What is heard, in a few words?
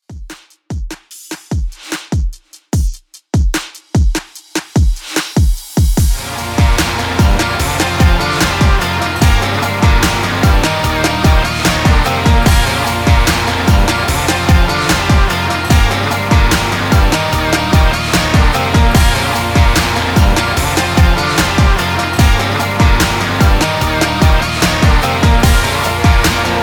EXTENDED 74 BPM; REDRUM